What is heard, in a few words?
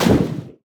enemy elemental melee